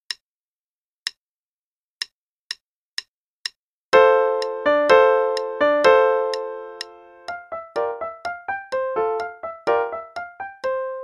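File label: Play-a-long, quarter note=126; Gretchaninoff; In A Woodland Glade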